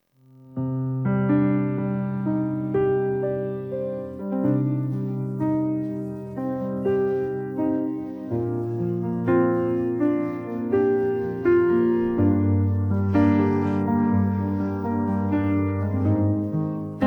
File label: Piano